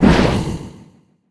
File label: swipe